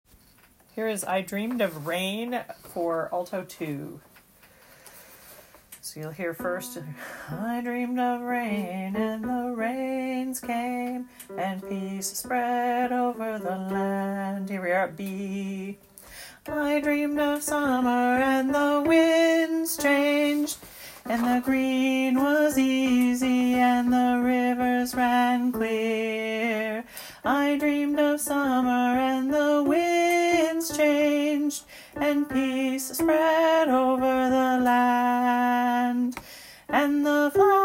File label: alto